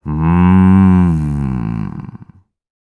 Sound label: human person says hmm as if something is tasty